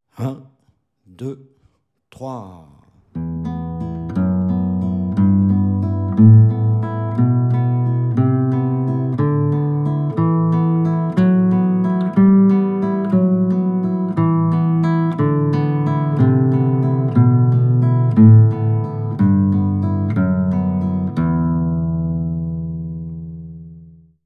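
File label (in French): arpege ascendant 060bpm, guitare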